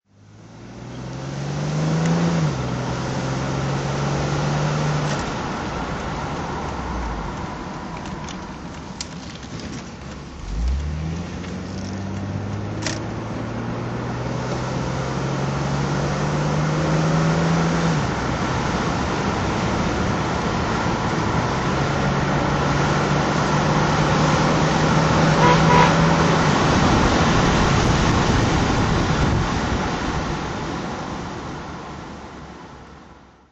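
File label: jaguar xjs40conv drive, Engine sound driving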